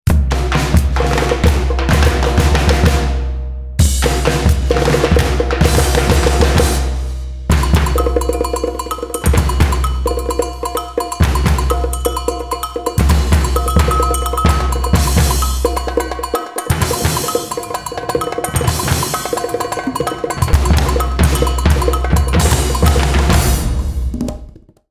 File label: Carnival Perc; Jane the Virgin